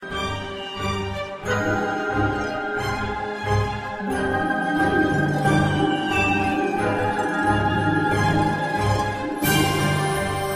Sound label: Mysterious Magic 180bpm; Ages of Mages The Last Keeper